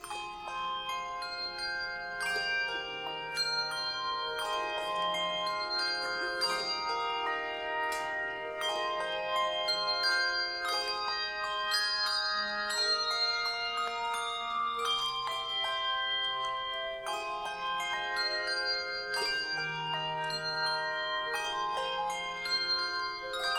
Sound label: Offertory, Carillons